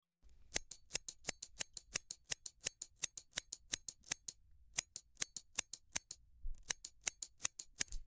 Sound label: Scissors cutting hair